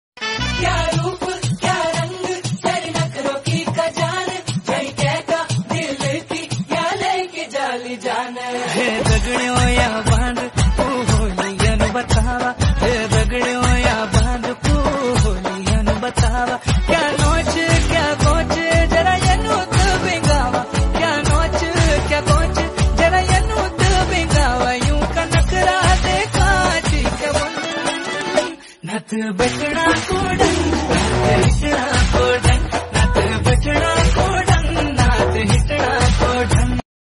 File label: garhwali song ringtone